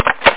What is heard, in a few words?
reload; R Dogz